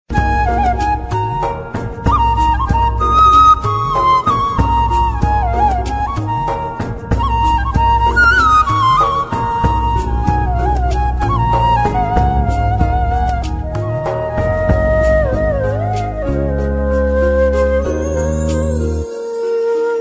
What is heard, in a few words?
flute superb